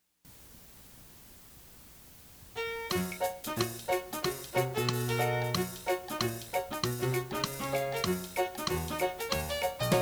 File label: Country